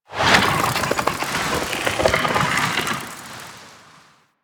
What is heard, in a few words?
Fishing Spear Fish Spook Flying Infested; Warframe